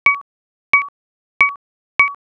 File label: drip